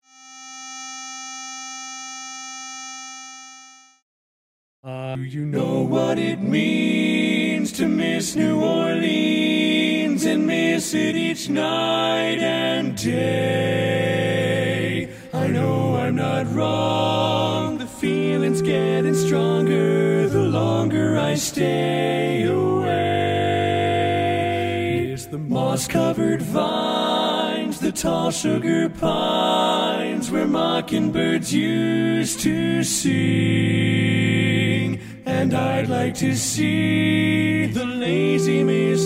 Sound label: Tenor